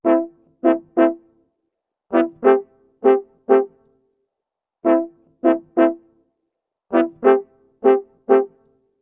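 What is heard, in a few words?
95 A minor brasses, Orchestral arrangements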